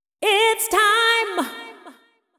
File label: deep house female, 125, A, vocals